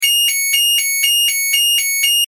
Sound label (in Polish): ton